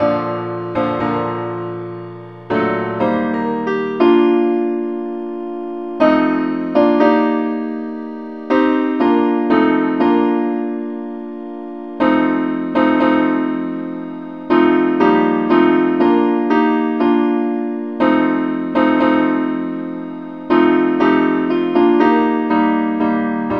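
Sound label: simple accompaniment keyboard; pilot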